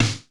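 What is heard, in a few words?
LIT SD, snare drum; LITE KITS; Crypton; DrumBase5000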